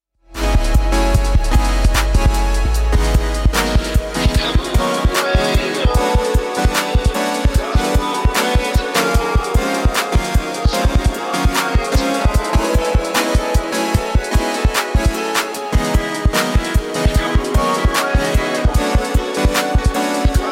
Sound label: machine drum